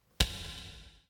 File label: Touch A Hand; LOOPERS